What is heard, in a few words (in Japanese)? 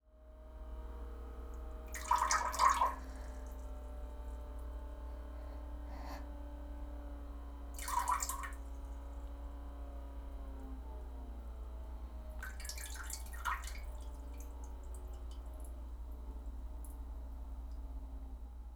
かすかな吐息、小分けおしっこ音, 少女, トイレ